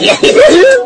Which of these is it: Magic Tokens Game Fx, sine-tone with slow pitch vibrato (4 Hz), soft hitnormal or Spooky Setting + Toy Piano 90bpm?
soft hitnormal